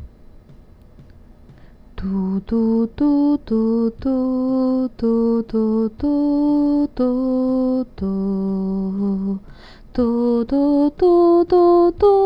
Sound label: melody